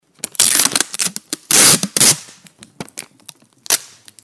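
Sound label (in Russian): отклеивание скотча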